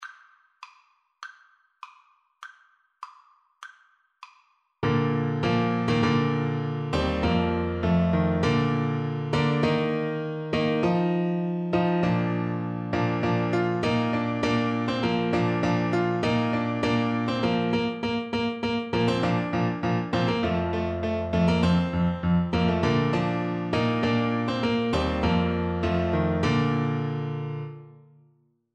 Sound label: Oboe